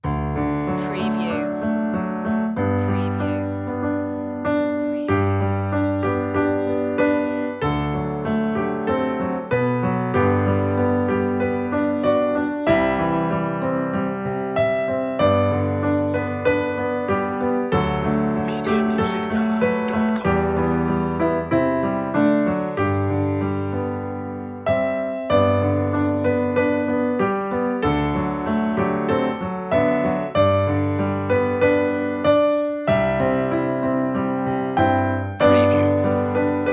auld lang syne aged piano 95bpm

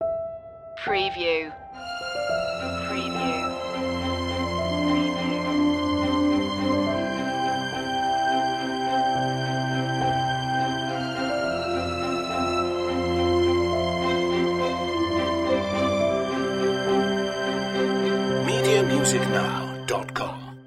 dramatic resolution

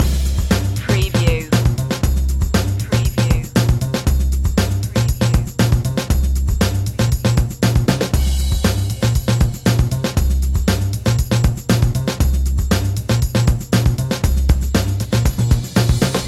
english gangster flick loop

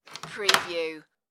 light room door open

